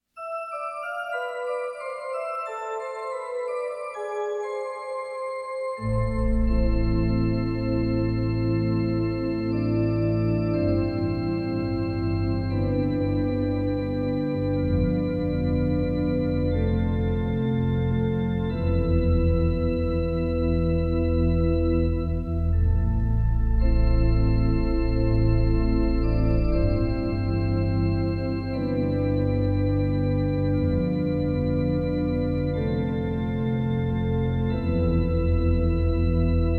Church Funeral Organ; Killer Party

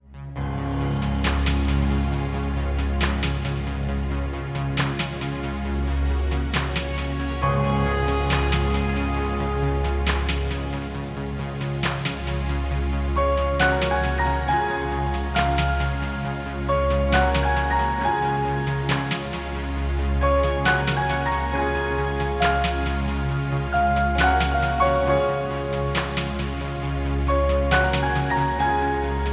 Synth

